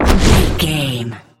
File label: bullet leave barrel slow